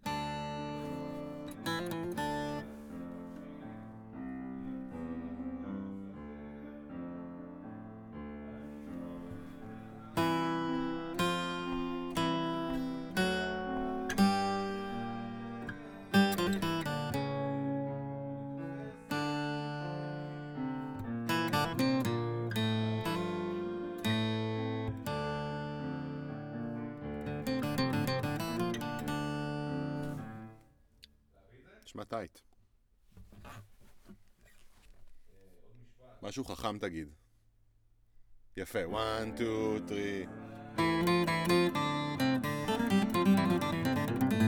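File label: guitar A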